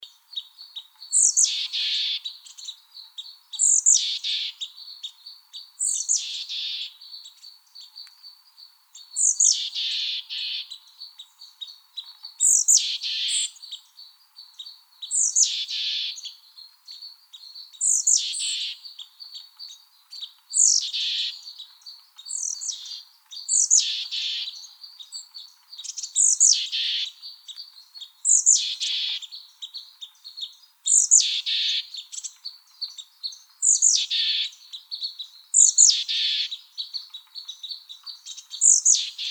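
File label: Mexican Chickadee calls, Chickadees Allies; MECH; deviche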